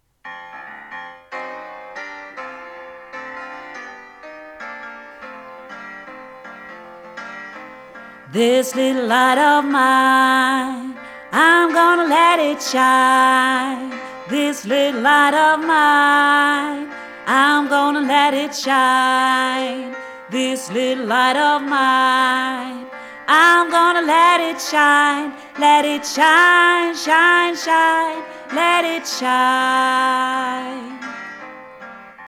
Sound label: This little light of mine - alt